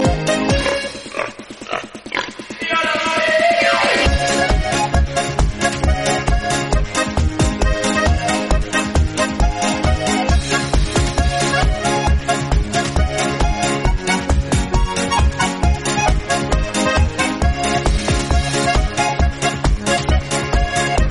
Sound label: silly polka